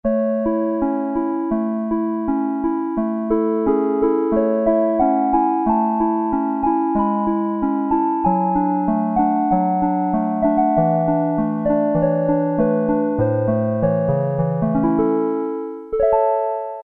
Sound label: Soft Piano, Korg Poly-800